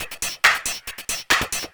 percussion loops, 138, Techno; PS-45 WAV Techno ID

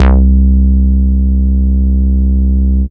Single Electric Basses, Dance Floor Bass

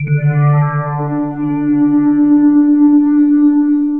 Resonance Melodic; Sonic Foundry (Sony Creative Software); Myths of Technology

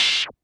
Open Hat Heavy Squash; Lost Tapes From Mars

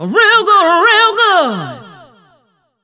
Funky Vocals; USB Soundscan vol.18; AKAI

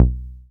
SYN 303, Roland TB-303

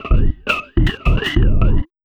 Kicked Bumpers, Fx; Sonic Foundry (Sony Creative Software); Esoterik Beatz

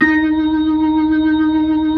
B-3, Blues, Keys of the 60s and 70s

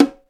BONGO, PERCUSSION; Crypton - DrumBase5000

